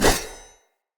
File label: Saw Dagger Whoosh Heavy; Weapons; Player; Swords